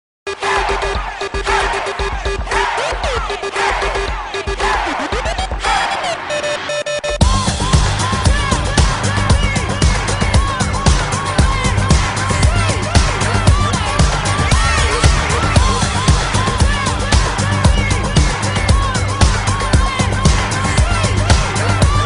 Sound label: Electronic Melody